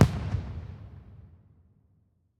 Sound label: Fireworks